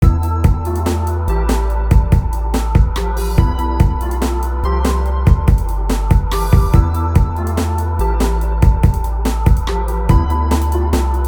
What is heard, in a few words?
Drum And Ambience